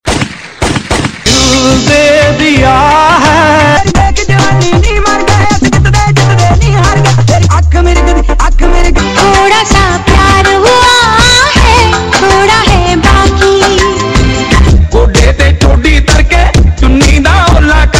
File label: Is Calling Rock